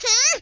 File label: hrmm; yoshi; SM64 CO-OP